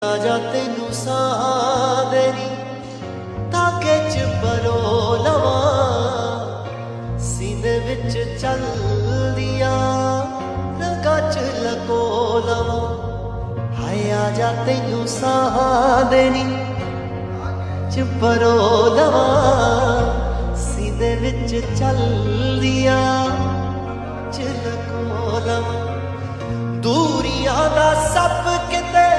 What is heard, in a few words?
Ringtone; panjabi ringtones